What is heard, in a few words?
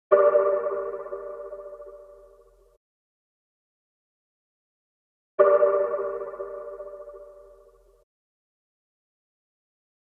91 BPM G Min Ambience; Loop Stems; Cymatics; Gravity